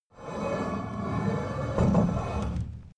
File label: metal heavy scrape